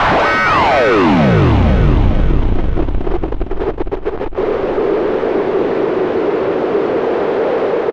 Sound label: Scary, nightmares; Dream Zone